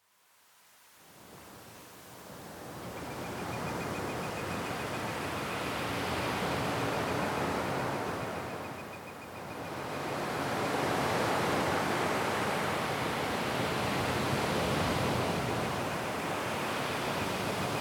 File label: SURF creaking EBB, waterline